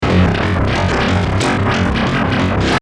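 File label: 174 glitch fx, Drum & Bass; Tech Flavours; Drum & Bass Sample Pack inspired by the sub-genre of Tech Step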